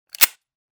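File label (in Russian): звук щелчка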